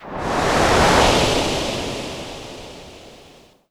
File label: Kawai K11 Seashore